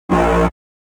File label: alarm